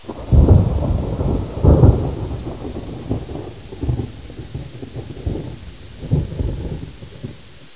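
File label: thunder far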